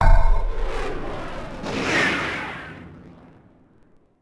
Build Jet Fighter; Civ4 warlords

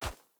wolf footstep snow hard; Rust

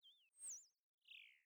sting bird chirp; Rust

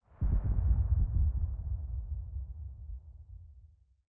combat atmosphere; World of Tanks

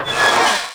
armour roof peel

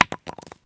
grenade collision concrete; Escape from Tarkov

